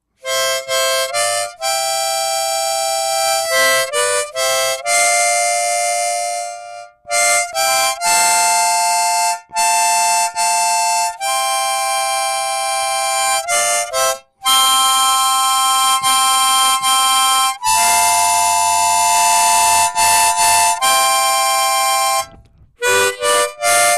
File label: double stop